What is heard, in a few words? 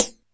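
hat closed, Alesis DM5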